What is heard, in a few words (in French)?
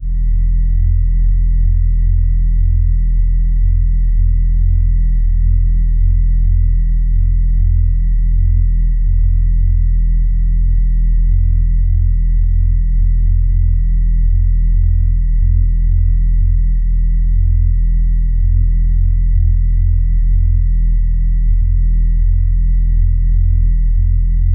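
moteur électronique discre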